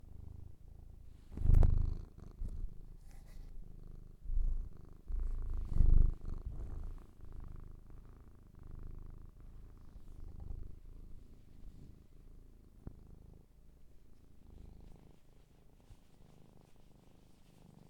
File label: purr